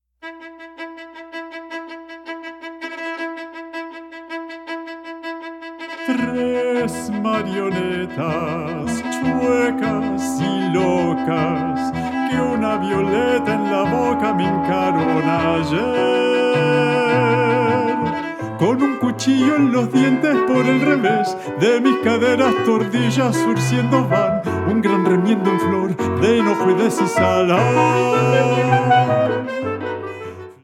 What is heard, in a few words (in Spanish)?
Milonga